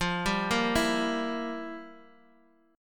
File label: F Major 7th Suspended 2nd Suspended 4th, F, down, Guitar, DADGAD